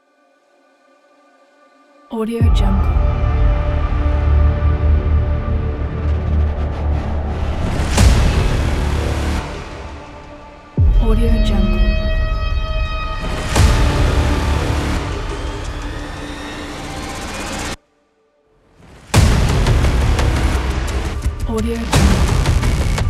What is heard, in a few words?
dark hybrid aggressive trailer